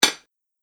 Medium Sized Dinner Plates Hit; Dishware Sound Effects; Household Sound Effect

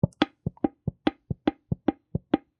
Oil Can Multiple, Times, Metal, Buckle, Antique; Industry, Oil, Can; Hand Tool; BlastWave

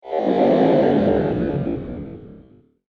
Garbled Alien Engines; science fiction sound effect; star wars / trek style games

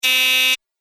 Door Security Buzz Sound; Doorbell Sound Effects

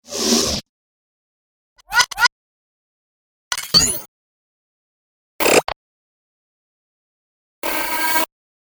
5 Sounds Production Elements, Electronic; Miscellaneous Electronic Sound Effects; Andrew Fleming

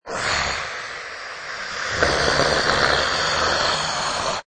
Monster Animal Sneer; Monster snarl: Monster, fantasy creature, threatening, snarling growl; Menacing sneer from werewolf or horror animal; Lynne Music

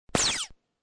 Lazer gun sound effect, single shot.; Laser Gun; War

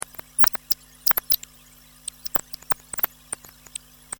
SciFi Spaceship Radio Bad Connection; Spaceship Radio - Bad Connection, Weird Alien Noises; Communication Sound Effects

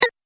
Multimedia Button

